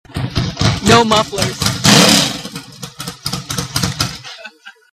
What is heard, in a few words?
open, Ducati